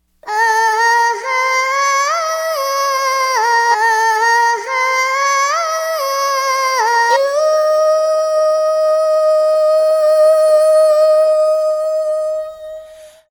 child moan ringtone

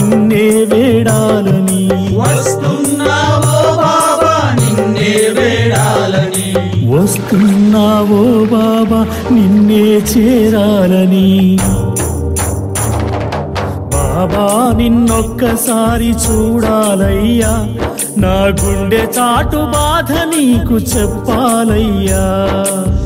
love music telugu ringtone

